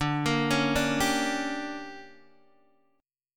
D Major 7th Suspended 4th Sharp 5th, Guitar DADGAD